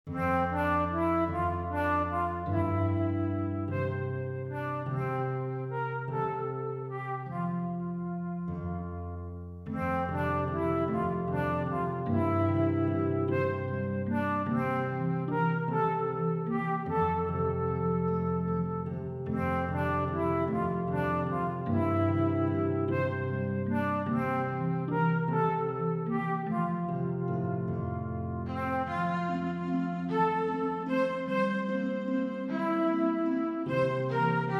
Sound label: INSTRUMENTAL; Jesus Wants Me for a Sunbeam